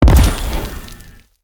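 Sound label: gold explosion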